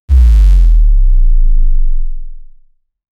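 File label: synthetic sweep with granular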